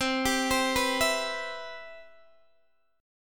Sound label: C Major 7th, Guitar DADGAD